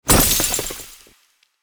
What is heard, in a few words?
Damage; SNKRX